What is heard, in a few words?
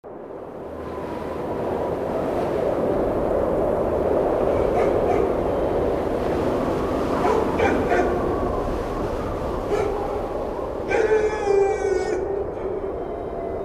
Amb Woods Ext